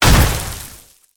step heavy

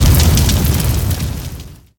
sparks

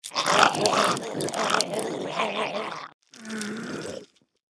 feral ghoul seizure long; npc

